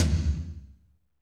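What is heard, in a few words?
tom low, fast rock, kit dry, Roland; Northstar; Drumscapes Roland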